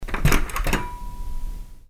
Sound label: Interior Door Lock